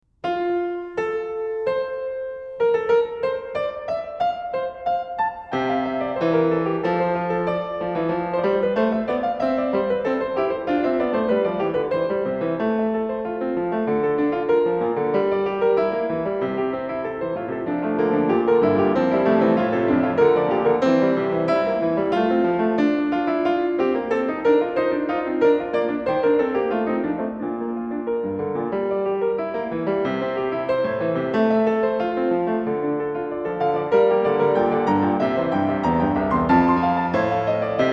Duet in F major, BWV803; Bach - The Art of Fugue; Bach, J S

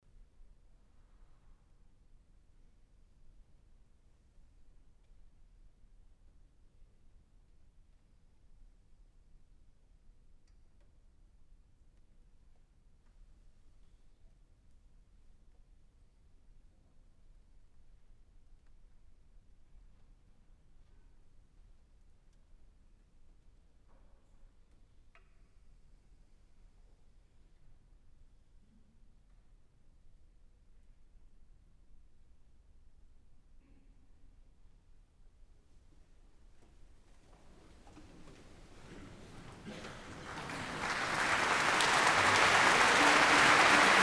Applause; Symphony No. 9

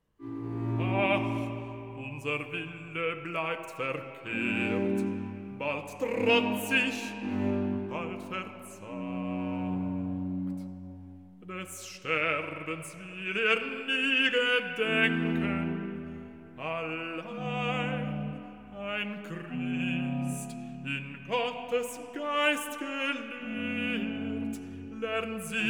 Recitativo Basso; Cantata No. 73, 'Herr, wie du willt, so schicks mit mir' BWV73; Bach; Philippe Herreweghe; Cantatas

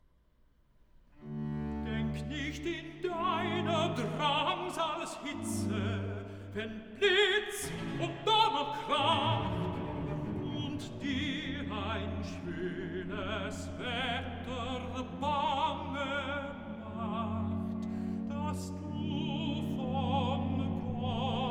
Recitativo; Cantata No. 93, 'Wer nur den lieben Gott lässt walten' BWV93; Tenore; Bach; Philippe Herreweghe; Cantatas

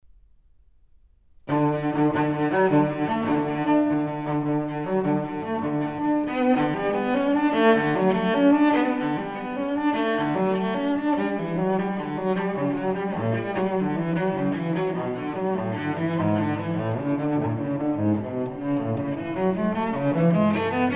Suite for solo cello No. 6 in D m

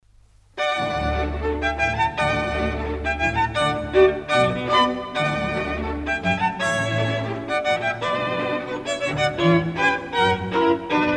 Violin concerto; Brahms

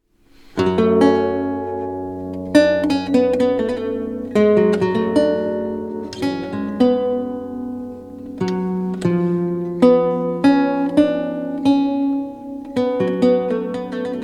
Lute Suite in E Minor, Sarabande; BWV 996 - IV.; Bach; JS Bach - Complete Music for Lute

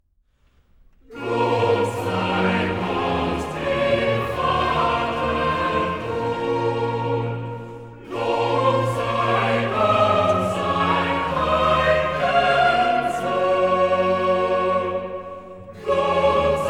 Chorale; Schwingt freudig euch empor BWV 36 Part 2 - 8 Chorale Lob; Bach; J S Bach; Advent Cantatas

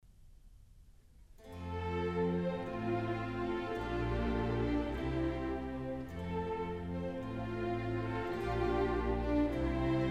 Water Music Suite

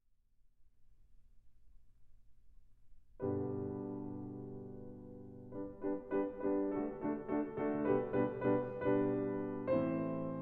Piano Concerto No 4 in G Major, Allegro moderato; Beethoven

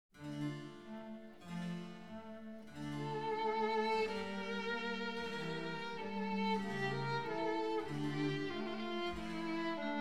Concerto in F Major, Andante; Concerto No 2; BWV1047; Bach; Bach The Complete Brandenburg Concertos

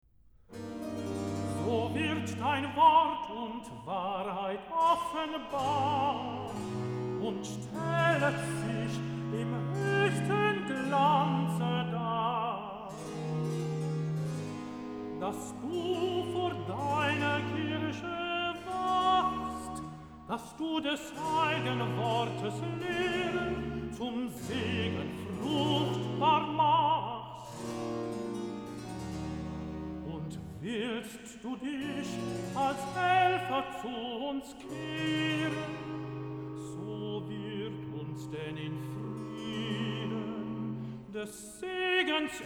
Recitative; Bach - Cantatas Volume 34; Bach, J S; Erhalt uns, Herr, bei deinem Wort, BWV 126

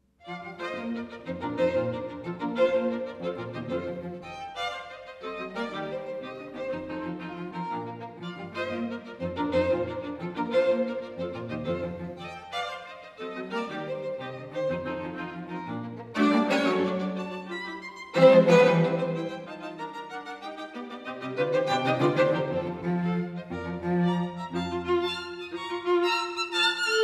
String Quartet in B-Flat Major Scherzo; String Quartet No. 6; Op. 18 No. 6; Ludwig van Beethoven; Beethoven - String Quartets Nos. 1-16

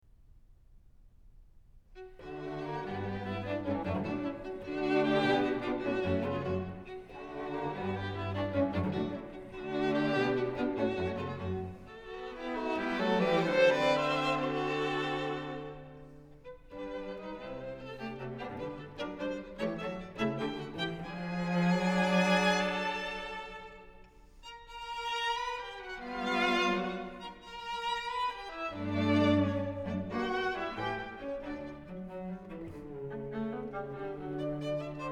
String Quartet No. 3 in D Major, Allegro; Ludwig van Beethoven; Beethoven - String Quartets No. 1-6, Op. 18

